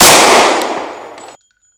9mm Shot; Zomboid 41.78.16; Project Zomboid

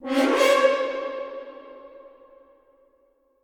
stab; Zomboid 41.78.16; Project Zomboid

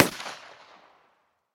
pistol distant; Zomboid 41.78.16; Project Zomboid

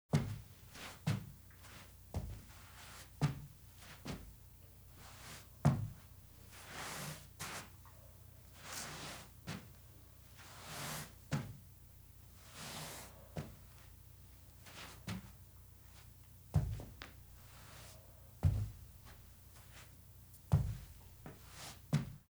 foot scrape male indoor full; Zomboid 41.78.16; Project Zomboid

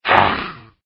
zombie hit; Zomboid 41.78.16; Project Zomboid

